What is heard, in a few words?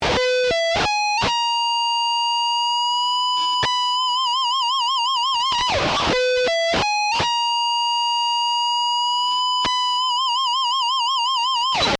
Exotic Guitar Lick